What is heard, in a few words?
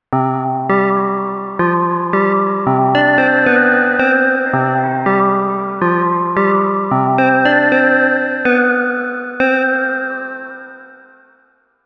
Mercury-4 A Little Shaky; Cherry Audio